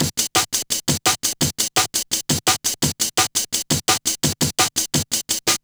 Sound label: Kickin Break, Drum & Bass, Beats & Breaks